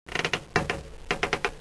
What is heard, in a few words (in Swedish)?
knarr; svencoop; saker